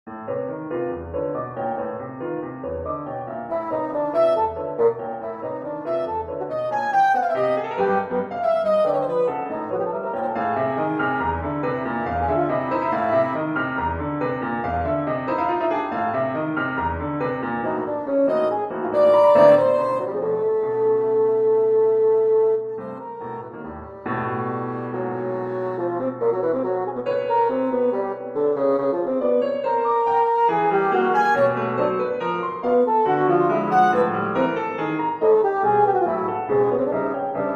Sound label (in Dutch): Saxofoon en Piano Presto; 3 Melodieën voor Saxofoon en Piano I. Presto